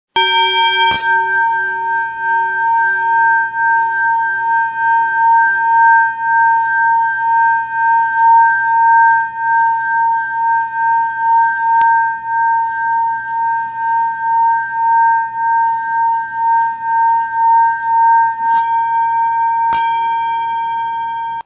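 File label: Monks bell sound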